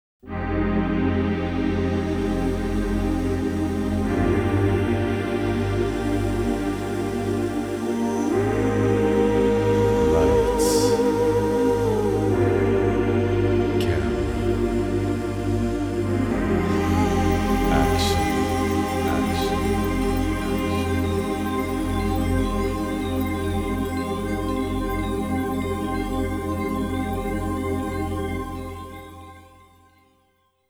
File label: Prelude